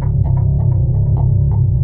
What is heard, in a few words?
bass loop